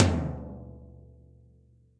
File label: Tom Floor Deep Snare Dynamics; Drum Hits; Ableton; Factory Packs; Session Drums Club; MPE Hits